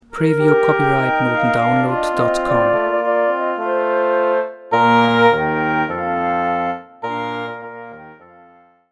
blaeserquintett

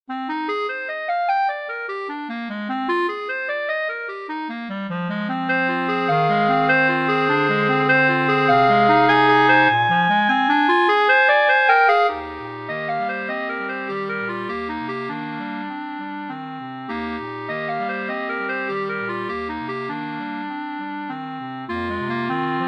Arabesque No 1, Klarinette Quartett 3 Klar. 1 Basssklar.

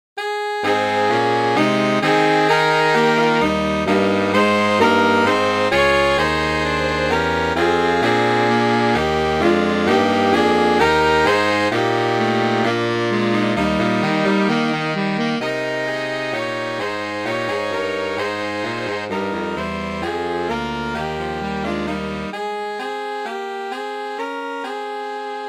Saxophon Quartett S AATB

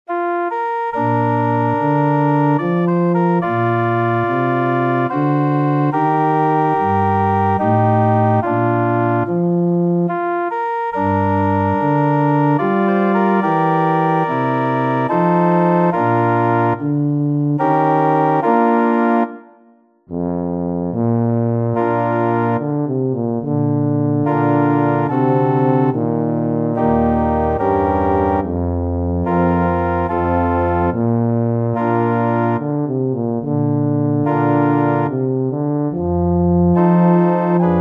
Tuba Solo + Orgel-Playback; Amazing Grace

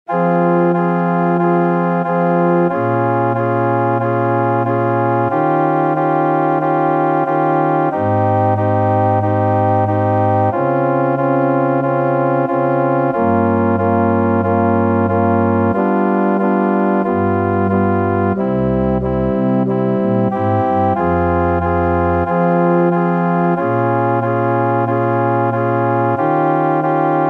Saxophon Solo + Orgel-Playback; Ave Maria

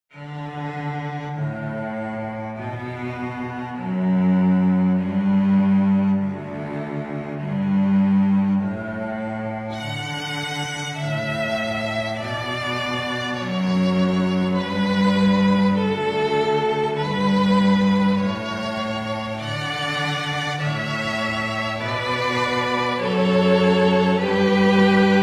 Kanon in D-Dur

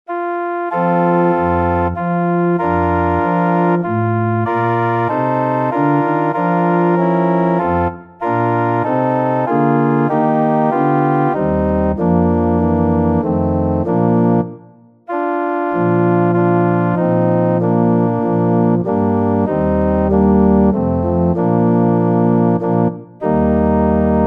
Fagott Solo + Orgel-Playback

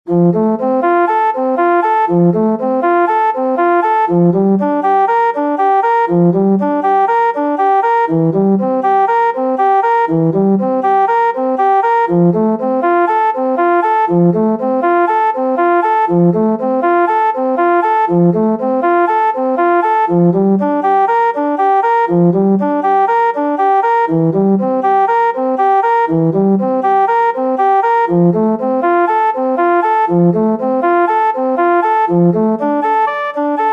Fagott Solo + Orgel-Playback; Ave Maria

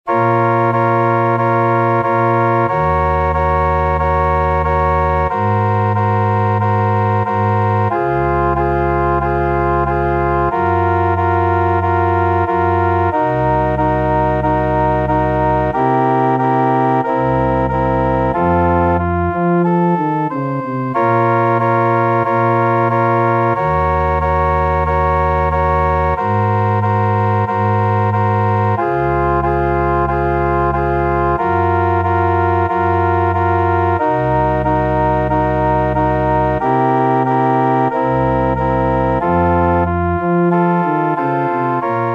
Ave Maria (Horn Solo +

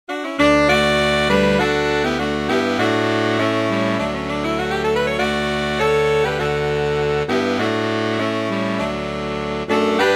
Saxophon Quartett AATB

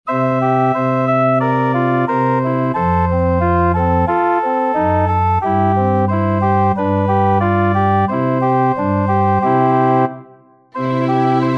Naeher mein Gott zu dir, Viola Solo + Orgel-Playback

